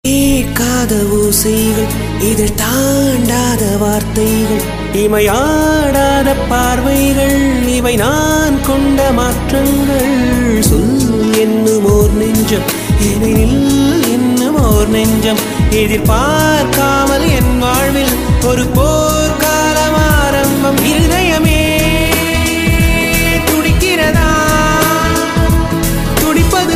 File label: Tamil Song